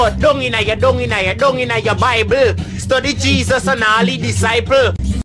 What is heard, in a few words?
guyanese ringtone